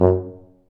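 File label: brass C tuba, staccato; Solo Brass; Roland LCDP12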